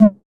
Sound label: 606 Dist. Tom